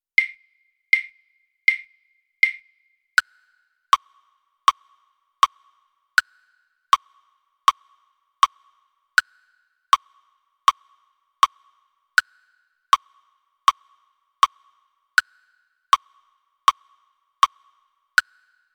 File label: blues click